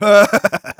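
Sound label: laugh evil, German; Medic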